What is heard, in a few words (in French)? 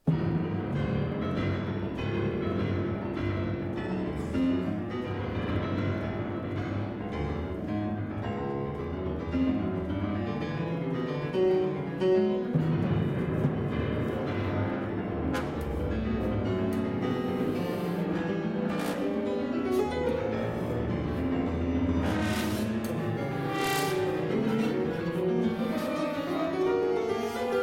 piano et orchestre